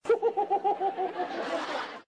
risa; don jirafales

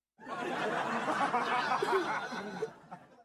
risa corta

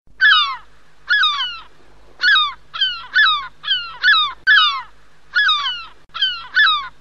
gaviotas playa efecto de sonido

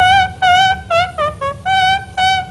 corneta

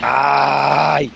aaaaaai

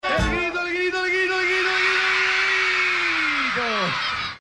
el grito

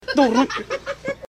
risa

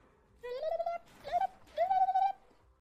guitarra

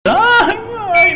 quejido

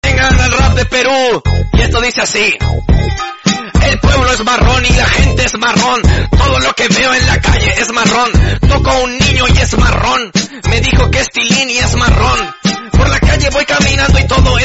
el rap

